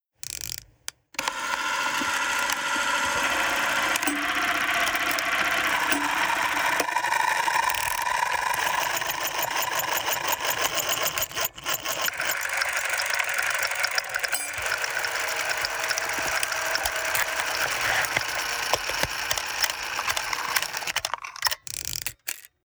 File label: SFX Camera; TPMG; promo